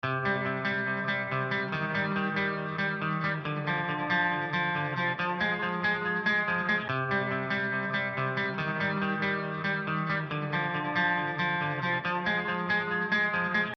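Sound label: guitar sample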